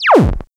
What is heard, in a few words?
HIT, STABS, House